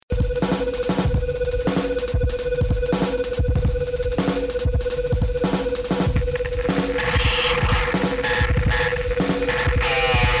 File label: instrumental vox sincopada